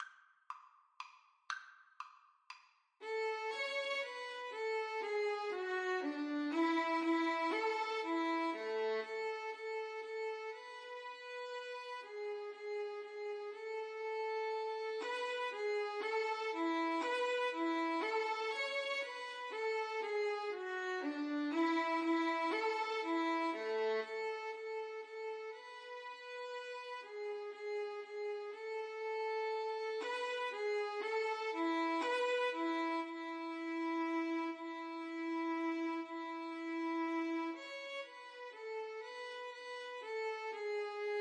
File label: Menuett violin duet